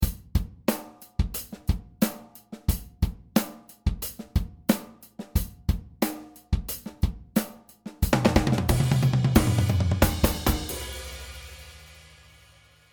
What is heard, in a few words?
Impossible fill, drum